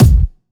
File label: Kicks; Vengeance Samples